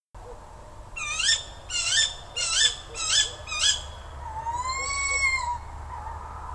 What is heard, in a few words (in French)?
cri d'animal nocturne; bestiole